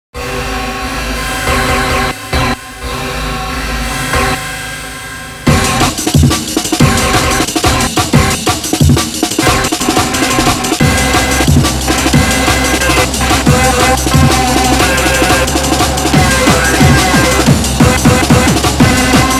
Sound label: 180bpm; hugz